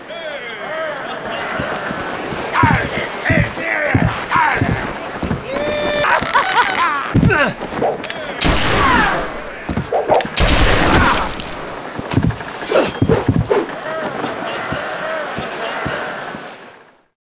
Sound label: duel spin intro sfx; Sid Meiers Pirates; XBOX